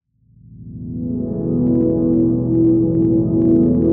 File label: 122 BPM Pads A maj F min Tonic A, ambient synth pads; MMB